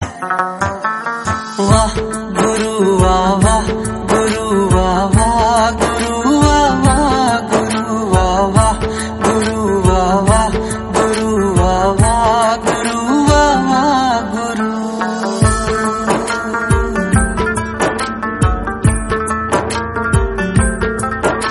devotional

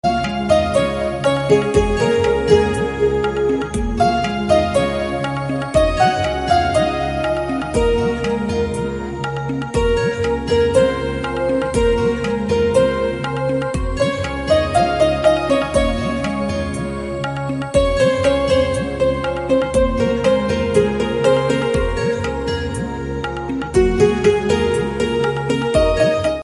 bgm remix hindi love; sanam teri kasam

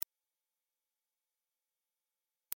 teen buzz

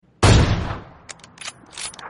sniper sounds; pubg

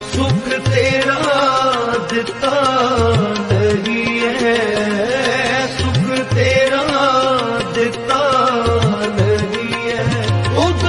devotional punjabi

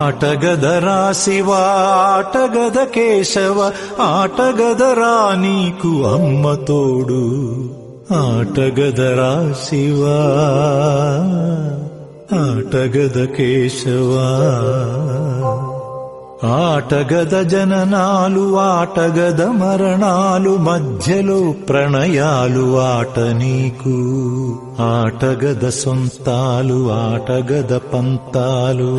telugu; midhunam

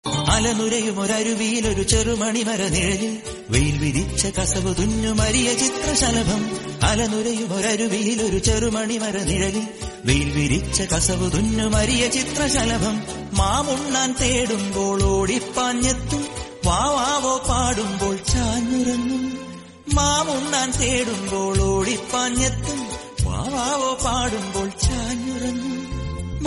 malayalam song; olympiyan anthony adam

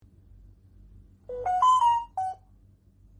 samsung bird sound notification

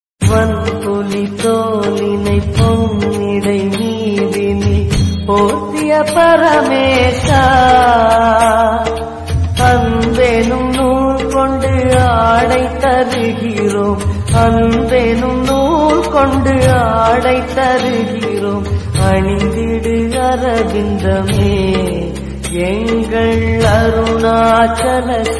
tamil old devotional song; vanpuli tholinai

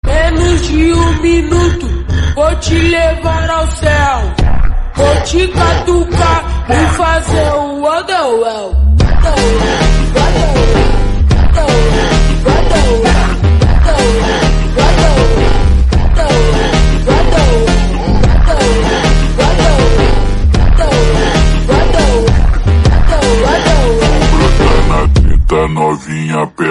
brazilian phonk bgm

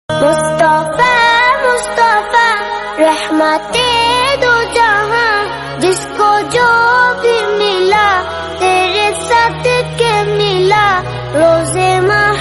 islamic song

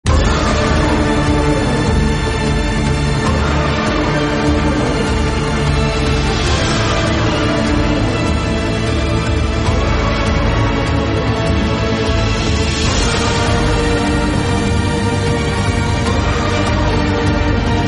instrumental music

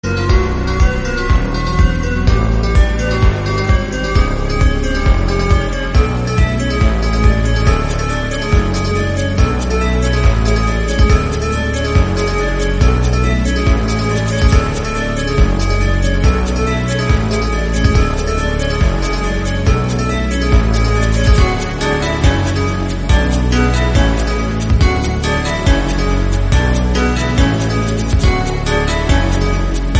slowed reverb bgm

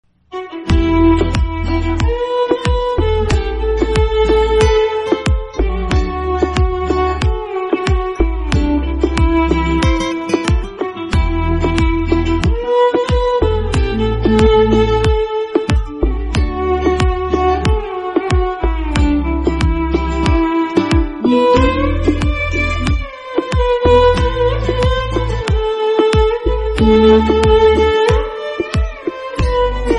bgm background music

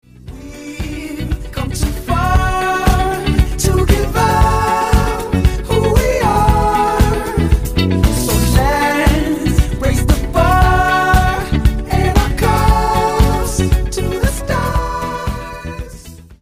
8 bit

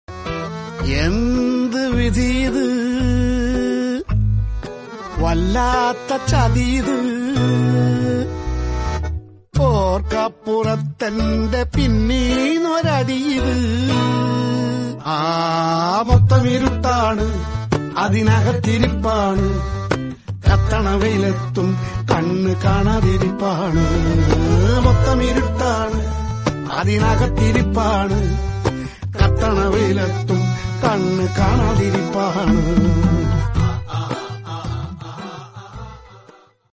movie song; thanneer mathan dinangal movie

